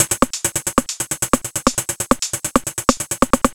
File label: 135 Electro Perc, Drum Loops; Zero-G; Soundclash Nu Dance Electro; Acidized Wavs; 135bpm; Ghetto Electro